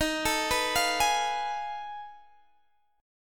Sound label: F 7th Sharp 9th Flat 5th Eb